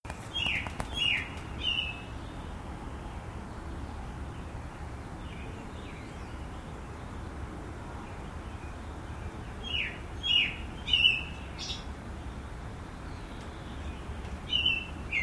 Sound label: Unknown Singing Bird